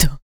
HIT, Jazz FX FEM PERC

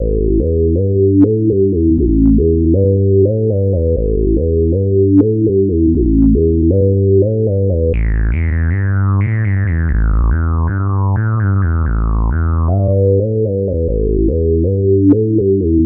Arpeggio Line, Synths

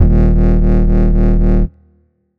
BASS 80 (D#); neuro; Stanza

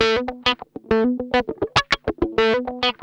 GUI 100 Bpm, Roland

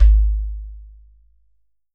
GHATAM

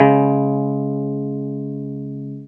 guitar 5TH

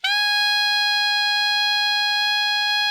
ALTO MF G#4, saxophones; Greytsounds - Philip Wolfe David Crozier's Saxophones; ALTO SWITCH

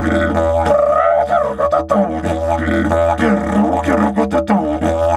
Didge 93 BPM, Didgeridoo

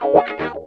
Wah Scale; Mo' Phatt; Digital Sound Factory Mo' Phatt

